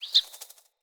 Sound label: Fey Flock Attack Dive; Powers